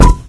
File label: concrete block impact hard; Source Engine; video game; physics